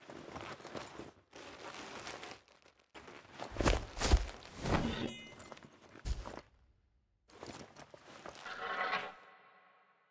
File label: Shot; we are the dwarves gamerip 2016